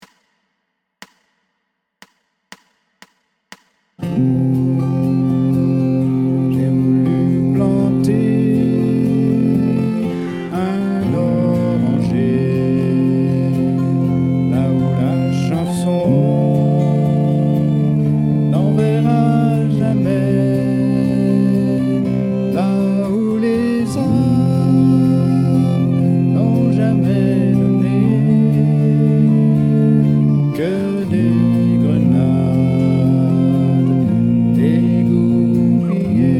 Alto

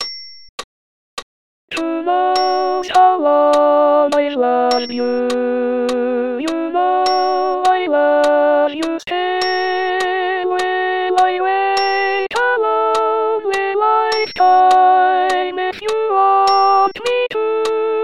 I Will Alto

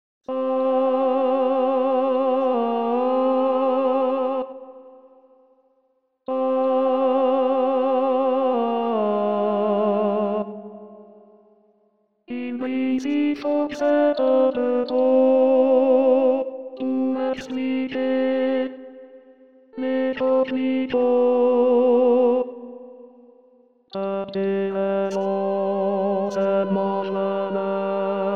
Tenor, Chorale

